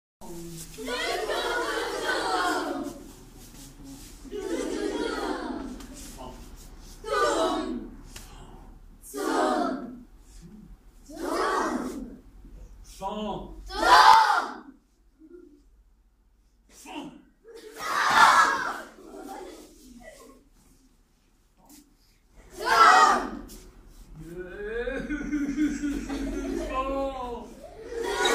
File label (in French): échauffement vocal